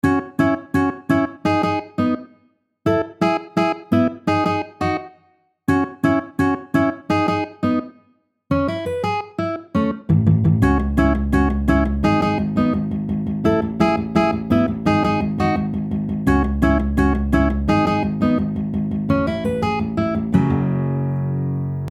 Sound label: riff